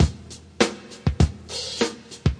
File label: breakdowntown, drum loops and breaks, 100 bpm; 091 to 100 bpm